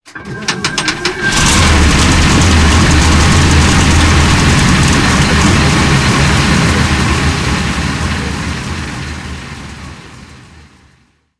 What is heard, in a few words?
panzer6 engine start, tanks; War Thunder